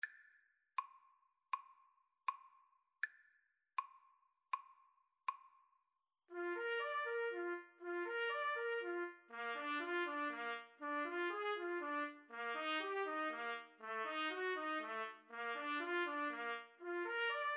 ave maria, Trumpet Duet

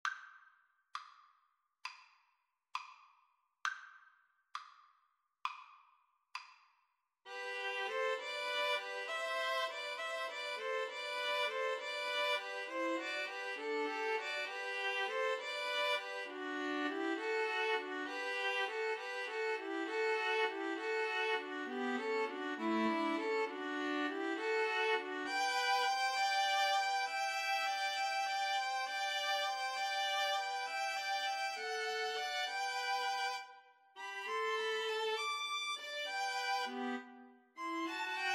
pastorale, String trio

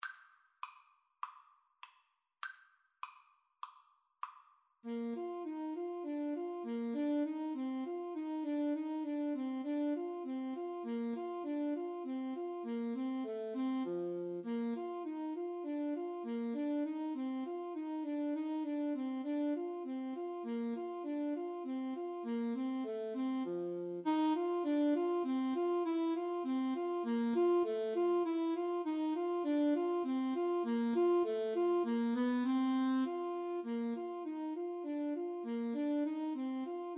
melody, clarinet saxophone duet

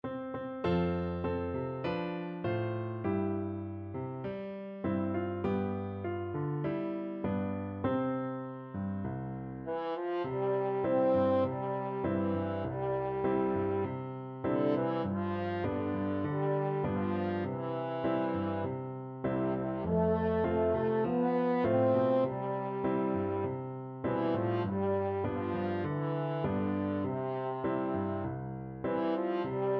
french horn

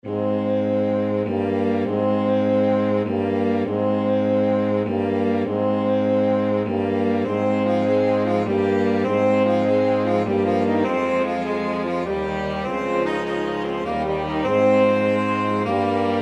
butterfly, flexible mixed ensemble 3 players

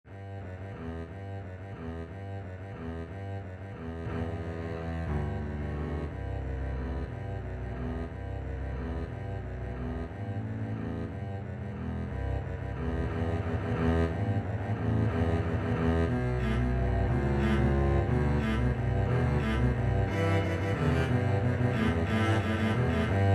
double bass duet

